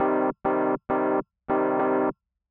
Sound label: Chorus